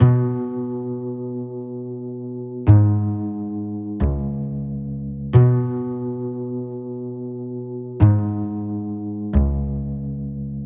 Loop 90 Hybrid Bass Bmaj Electronic Pop Island Future Afrobeat; Goodbye